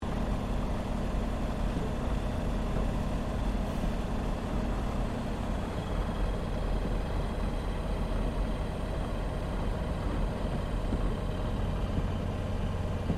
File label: AIRCRAFT YAKOVLEV YAK-52 TAXI INTERIOR, Russian Yak 52 Training aircraft; Airplanes